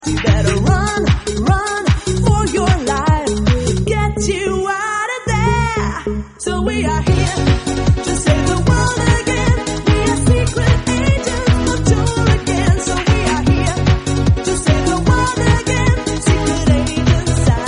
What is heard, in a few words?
SECRET AGENT IN DA BASS MIX